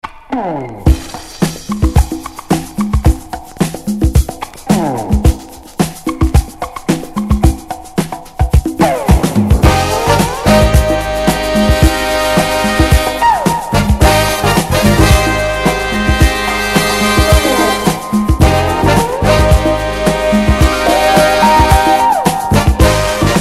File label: Disco Jazz Band; Hollywood